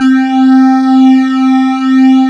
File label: Analog, Synthesizers; Soli-Music; World of Synthesizers VOL-1